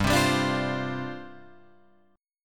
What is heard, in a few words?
G 7th Suspended 4th G down Guitar Open F; Listen to G7sus4 strummed; G7sus4 Chord